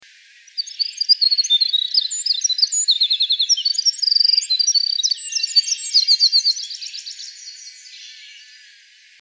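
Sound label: wren voice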